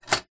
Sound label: household popcorn maker machine metal kettle lid close